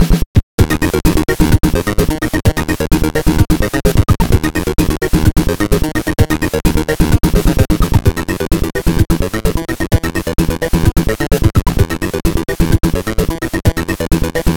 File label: Arena; 3D Classics Kirby's Adventure